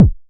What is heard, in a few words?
Click; Clicks